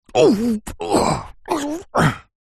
human male grunt pain

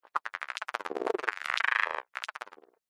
glitch digital; sound design

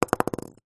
polystyrene ball small bounce; foley

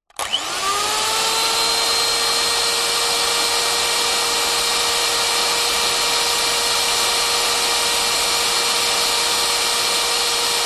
household electric whisk on run off; zapsplat

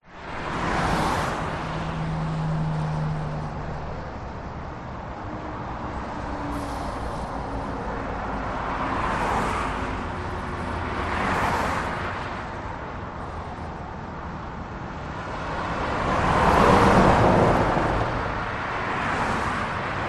traffic motorway cars trucks

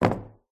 phone drops on wooden table; your free sounds

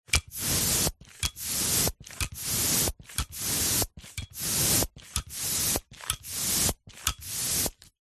sport football pump hand pumping nozzle end; zapsplat

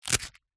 foley wallet velcro rip coin movement; zapsplat

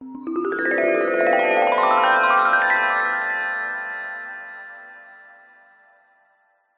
magic glissando ascending bright warm twinkle

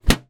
industrial tool box metal lid close; zapsplat

